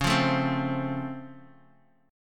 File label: C sharp Augmented Major 7th, C sharp, chord; down; chord shape